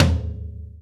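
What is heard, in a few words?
floor tom 1 rimshot, drum kit; 90s sample CD; drum kits small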